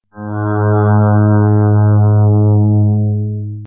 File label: synthesizer